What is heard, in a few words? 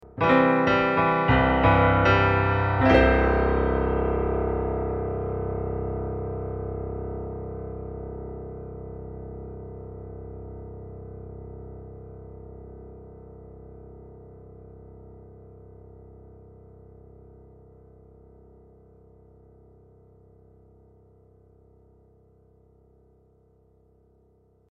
gp 510 berlin grand ausklang; Casio; Casio GP-510 Test